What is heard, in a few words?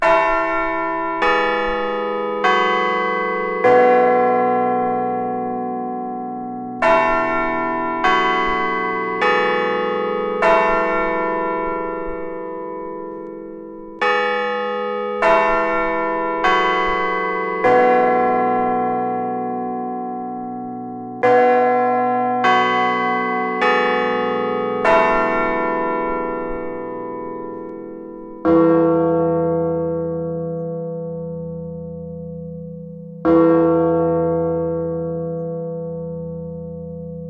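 Westminster, courthouse bells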